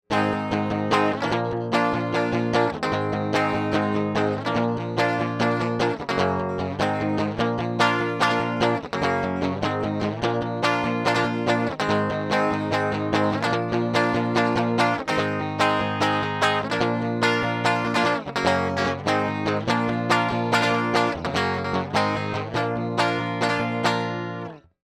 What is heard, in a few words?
strat